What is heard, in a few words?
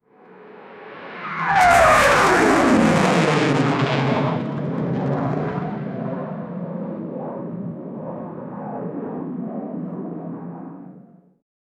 WW2 B17 bomber, aircraft; europe air